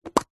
leisure toy plastic bubble two halves pull open

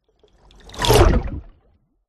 Cinematic Underwater Whoosh

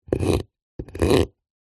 foley wood scrape sharp knife score against grain

